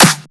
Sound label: raw clap one shot d sharp key; percussion; single hits one shots